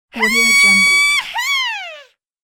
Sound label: cartoon manga girl scream